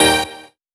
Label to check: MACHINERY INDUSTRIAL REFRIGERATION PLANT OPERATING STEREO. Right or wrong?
Wrong